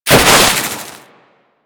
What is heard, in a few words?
Heat Gun Hit Effect; Grineer